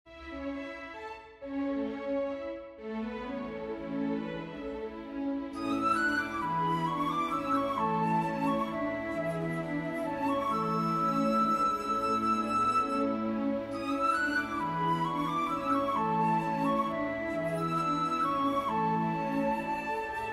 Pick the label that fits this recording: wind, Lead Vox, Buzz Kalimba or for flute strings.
for flute strings